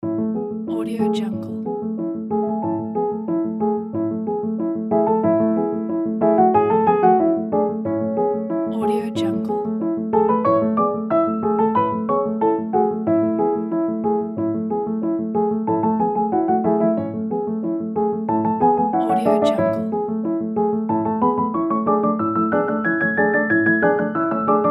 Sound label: creative idea; serginxs